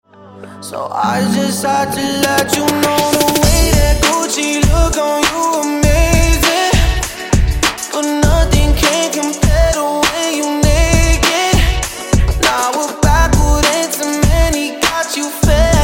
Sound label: Hype Extended; Redrum